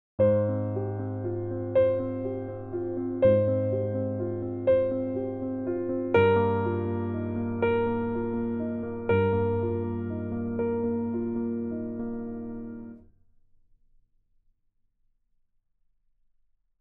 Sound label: Arpeggios, piano